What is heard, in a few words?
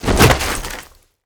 Body Fall